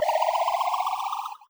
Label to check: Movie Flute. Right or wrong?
Wrong